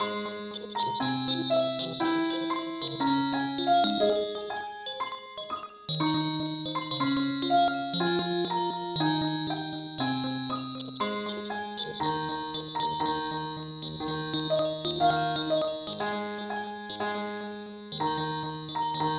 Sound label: mandolin key aes